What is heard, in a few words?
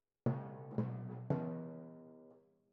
Timp Close